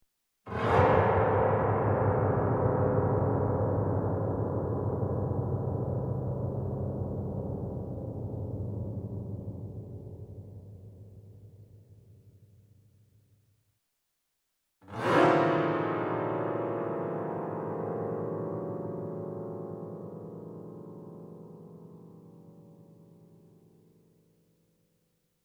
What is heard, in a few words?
Piano LOW Gliss Suspense; Electa Quinney